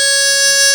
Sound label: HARMONICA C#4; AKAI S-Series CD-ROM Sound Library VOL-2